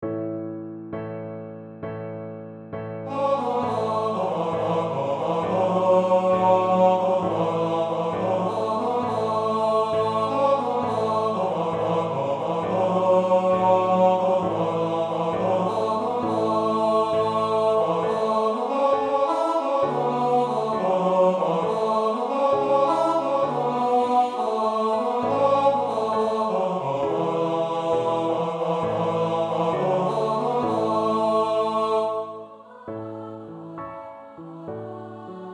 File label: Tenor